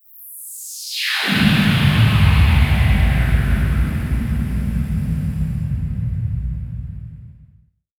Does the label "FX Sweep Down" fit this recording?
Yes